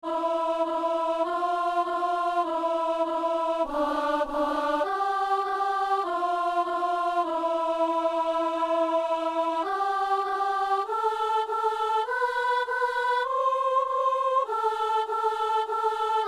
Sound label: soprano; Messe du 17 janvier à Saint-Denis